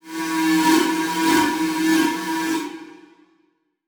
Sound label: Transition Whoosh Multiple